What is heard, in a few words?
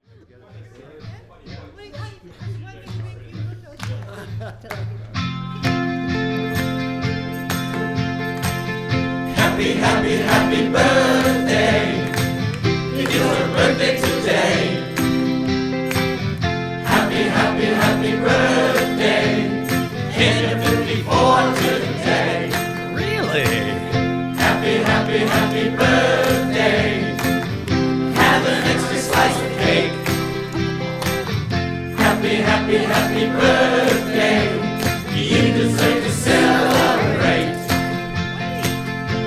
Happy Happy Happy Birthday